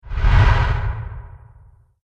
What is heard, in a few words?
Medium Deep Whoosh